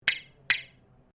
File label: METAL ON METAL RAILING; Materials